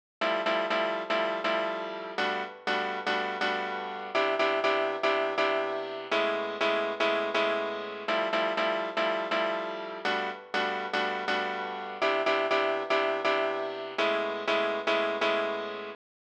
122 Amin Piano

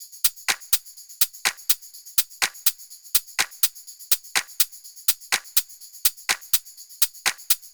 DEEP VIBES TOP LOOP 124BPM

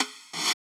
Brush

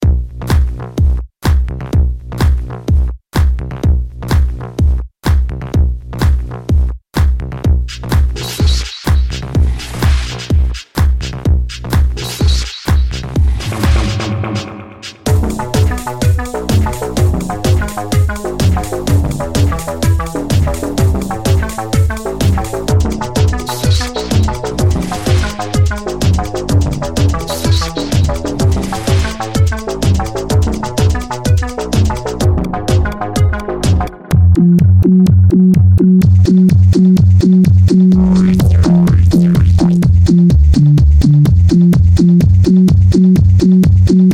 Basses

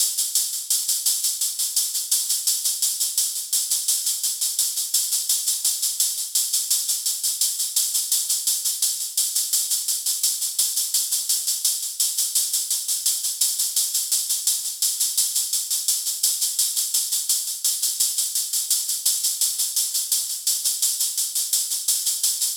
Hats 85BPM Acoustic RNB

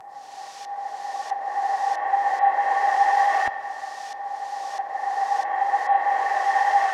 Texture 138

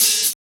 hihat one shot open; TROPKILLAZ

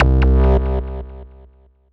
C Filter Synth

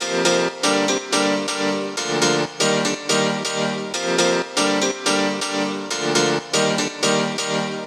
Piano 122 F Wet

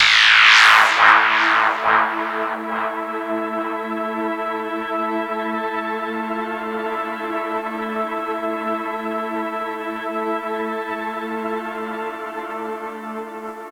140 SYNTH A

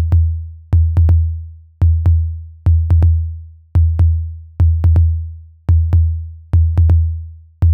synth 124 Am

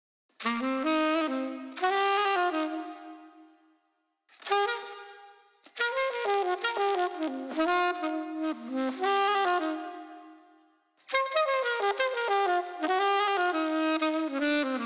Sax Loops

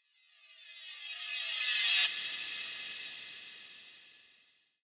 Swoosh 172bpm 4bars

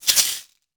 DEGRADED BEER PRC

